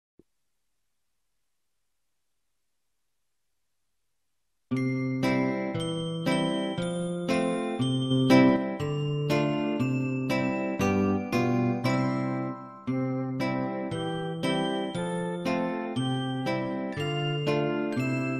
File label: Twinkle Twinkle Little Star Nursery Rhyme Karaoke; Karaoke and Lyrics Version